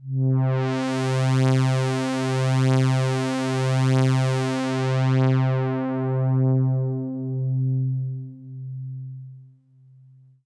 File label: Pad C, Synth Prophet One Shots; Electronisounds